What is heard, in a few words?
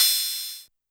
Cymbal Synth; YAMAHA RM1X